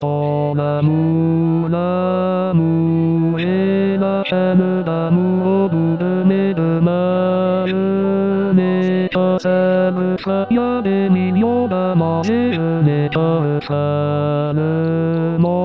tenor

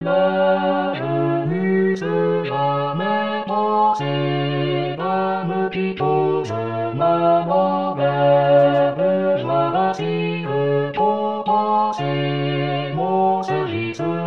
tutti; rimailleurs

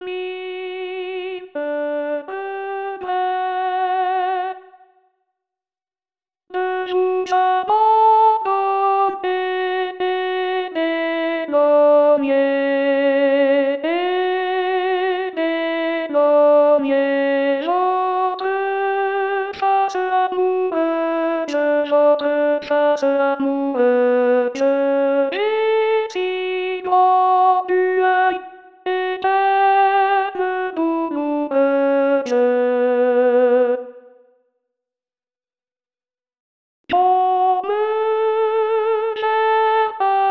alto; rimailleurs

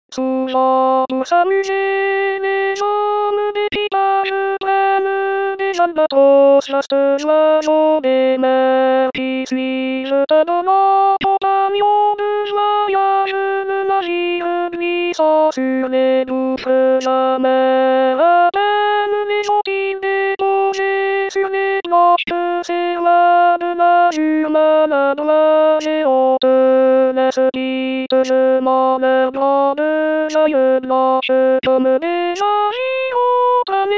alto; lalbatros; rimailleurs

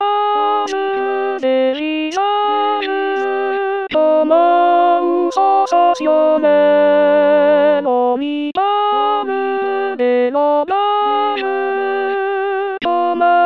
alto

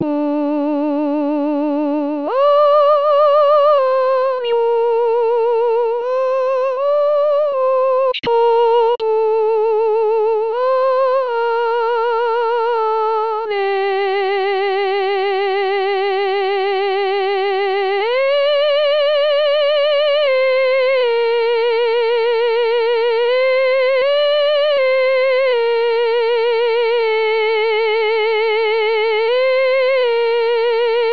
sopran; rimailleurs

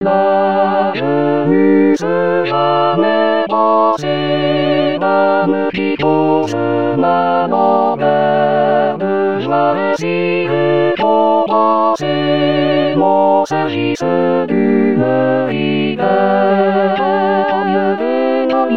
tutti

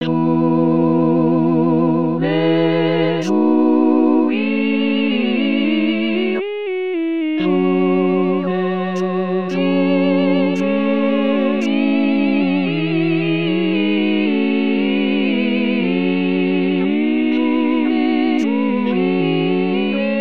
tutti

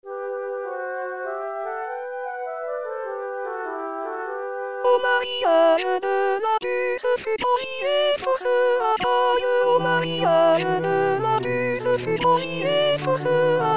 soprano

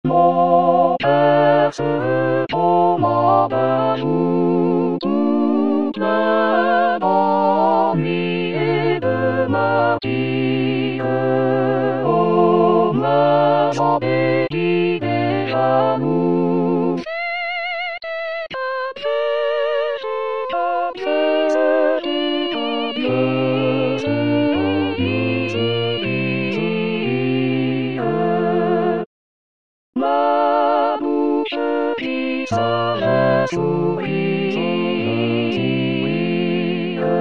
mon coeur tutti

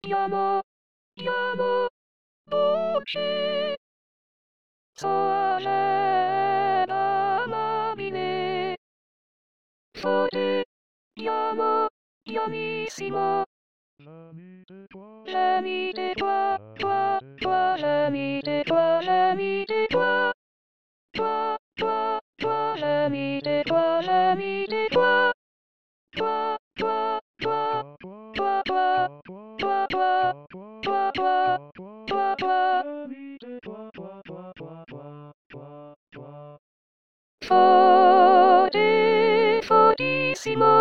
soprano; insalata; 2019 st jean

